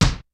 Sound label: kicks snare, kid; Kid Nepro; Elite; KICKS SNARES